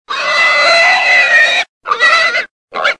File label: Hurt'n Hog